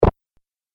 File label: Arcade Game Drop Sound; Video Game Sound Effects; Retro Inspired; Nintendo, Atari, C64